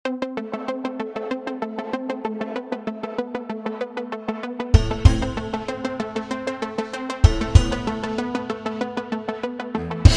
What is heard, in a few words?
String Drama Unfolds